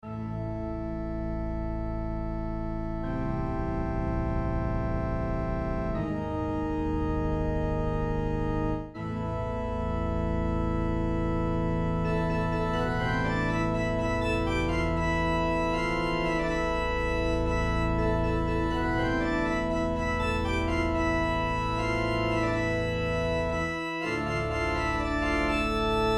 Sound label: Waltz Organ; Church Organ